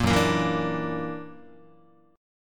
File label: Gb Augmented 7th Gb down Guitar 4A,4E,5A,5D,5G sharp,6C sharp; Gb+7 strummed